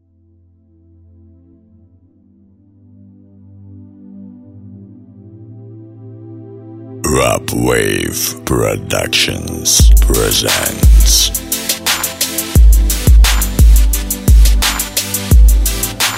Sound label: light beat